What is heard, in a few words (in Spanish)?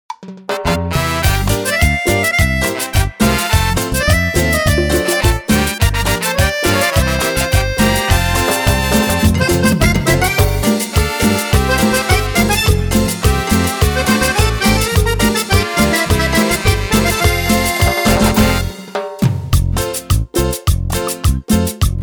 Versión Cumbia